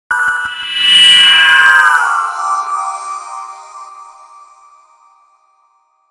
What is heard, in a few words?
Hit Burst Swell Delay Shimmer; 5.1 STEREO MIX; Blastwave